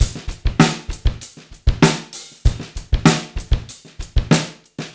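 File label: 97 bpm, beat loops; Zero-G; Monster Beats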